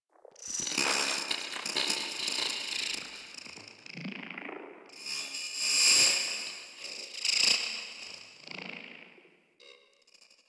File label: Tech One Off; Warframe; Sacrifice; Vitruvian Memory